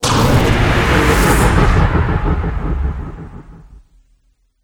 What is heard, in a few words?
flight turn explosion, tardis; p00gie